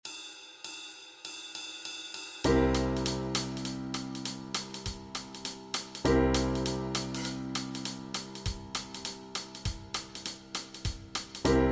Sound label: Backing Track, walking bass